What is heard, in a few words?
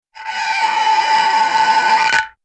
cartoon squeek